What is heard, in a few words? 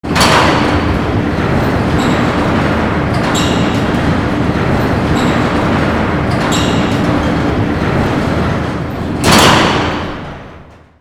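hangar door open; Hanger Door; mohaa; mechanics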